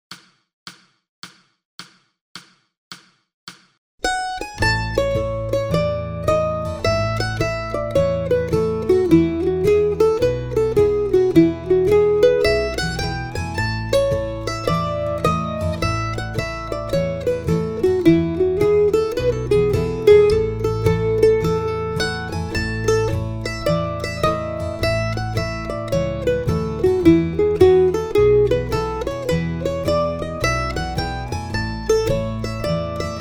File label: Slow Mandolin